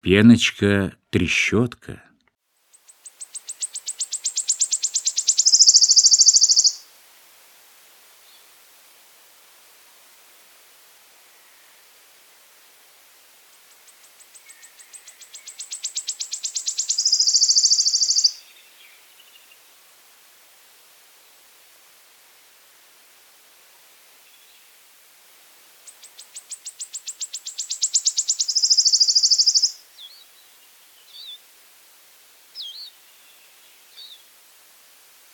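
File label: wood warbler; Penochka-treshetka; Golosa ptic i zverei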